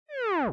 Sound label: plant Damage; Food Farmer